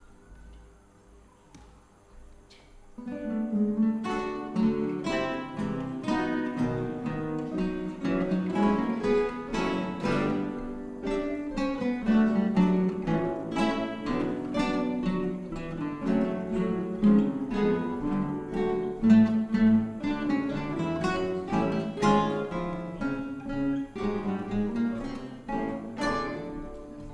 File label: gavotta, guitar